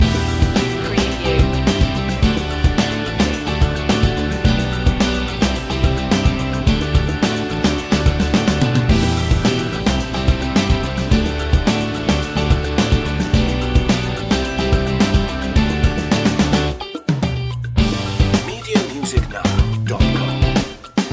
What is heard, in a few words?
piano groovin loop